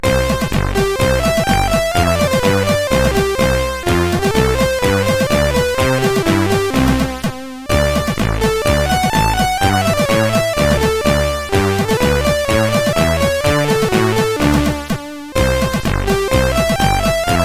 sid CSG8580R5, GAMES; Break Down

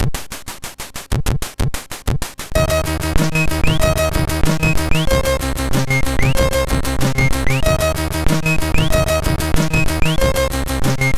Tempo Changes, MOS6581R3

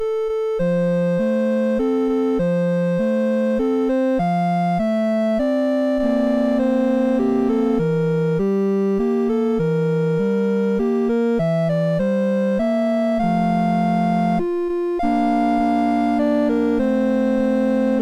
Lullaby, MOS6581R3

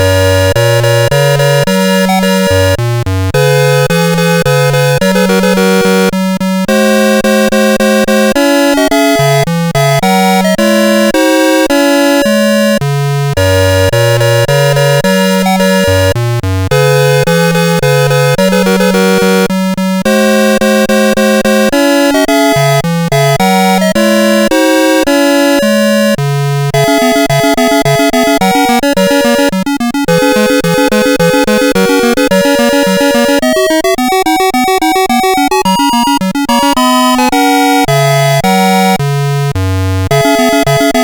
Menuet Don Juan, CSG8580

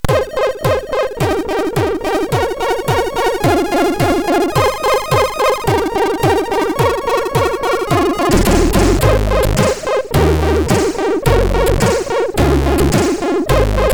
Amiga, MOS6581R4 sid; Sphinx

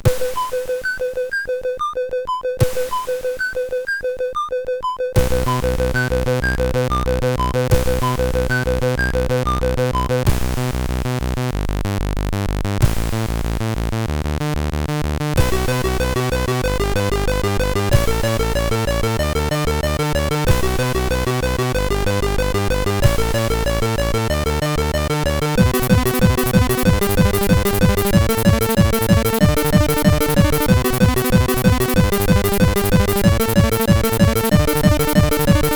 intro sid CSG8580R5

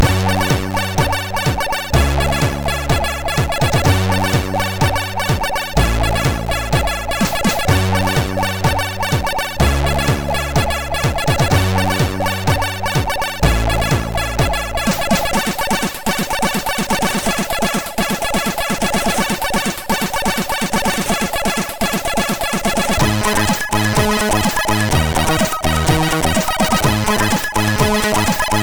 Intro, MOS6581R3; hvsc

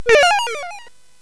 SFX; Narco Police; hvsc

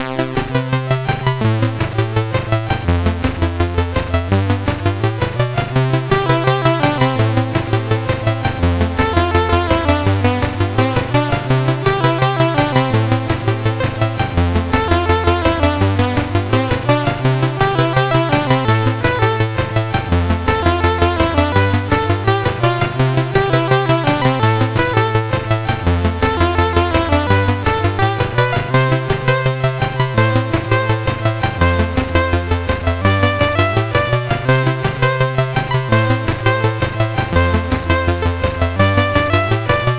Solomons Key, CSG8580R5

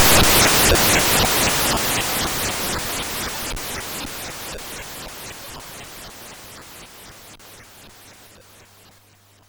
Frantic, MOS6581R4

